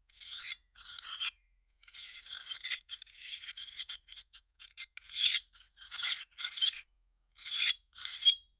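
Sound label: chitin scraping exoskeleton, monster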